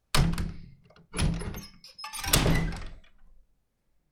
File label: Door front